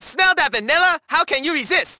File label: icecream chinese; Gtaiv